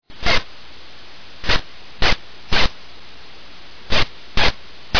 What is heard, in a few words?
turkey chirp